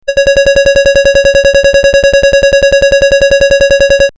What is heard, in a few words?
bell